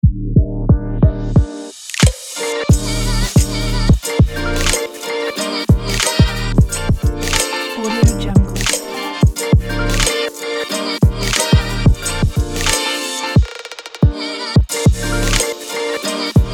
beat kit

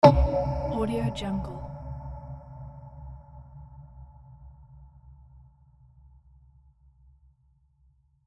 impact effect

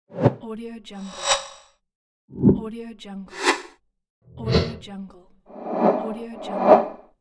transitions

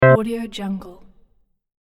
error accent noise

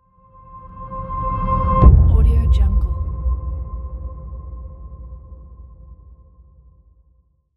piano reverse impact

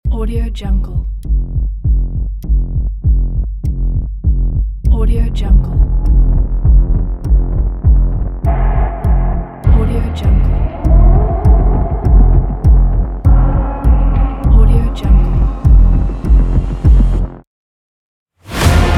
modern trailer teaser

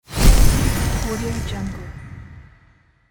dark cast

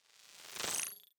ui sci fi weld

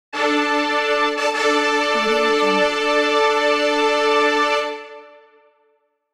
regal entrance fanfare

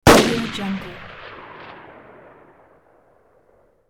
rifle blast single exterior long decay

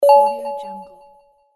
text message

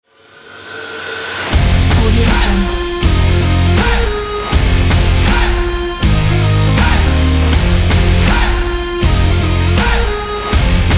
cool rock logo

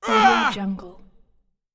male pain; stormwaveaudio

